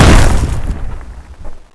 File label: tyrant jump land